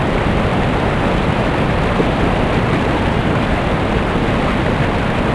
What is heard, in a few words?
Ambient; XBOX; Finding Nemo